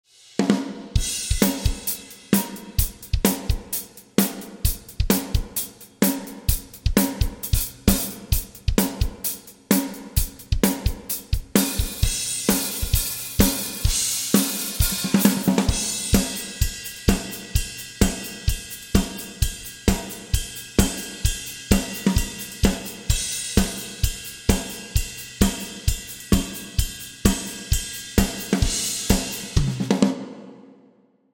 Drums Klark Teknik DN 780 Hall; Audio Ease Altiverb XL plug-in is a convolution reverb

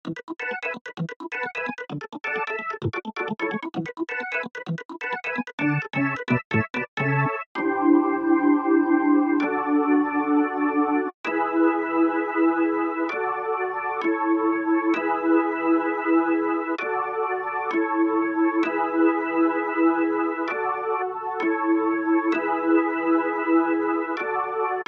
Organ Juno

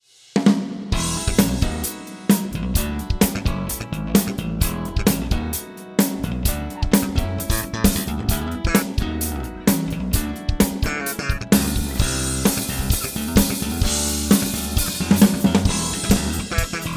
Korg A1 Drums Slap Spring

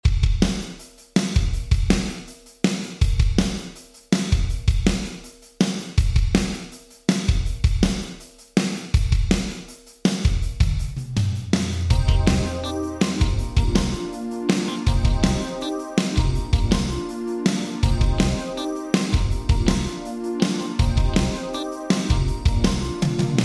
Drums Korg DRV 2000 Ambience Room Vintage Digital

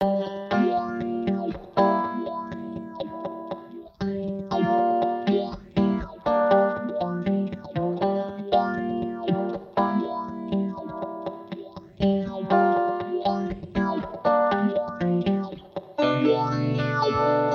Eventide Instant Phaser Guitars; Eventide Model H910

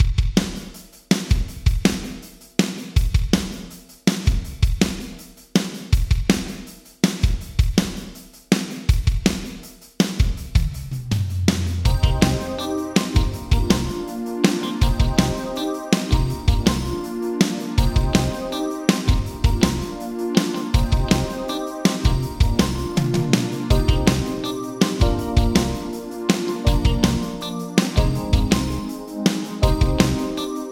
Drums Yamaha Rev7 Large Hall Vintage Digital

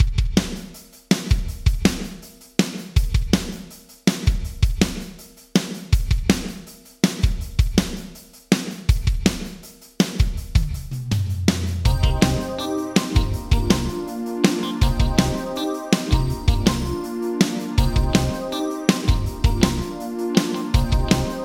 Drums Yamaha ProR3 Wood Room Vintage Digital

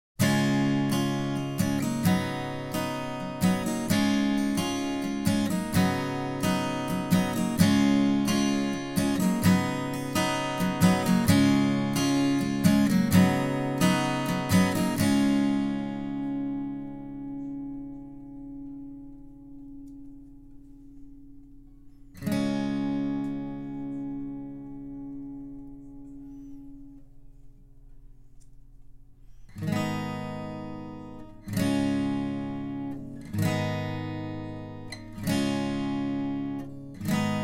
Acoustic Guitar Dry

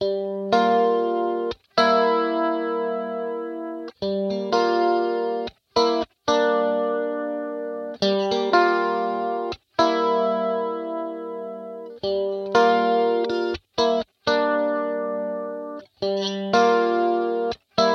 Electric Guitar Juno

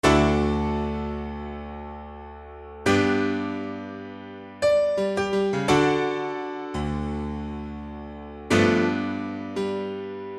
Piano Dry

